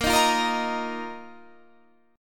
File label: A sharp Suspended 4th Guitar Standard; strummed; A#sus4; JGuitar